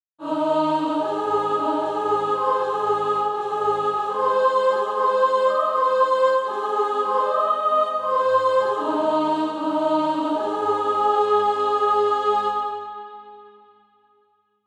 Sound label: Bugle Call sop